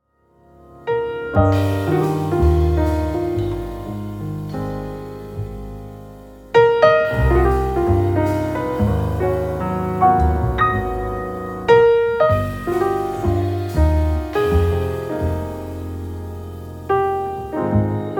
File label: Lullaby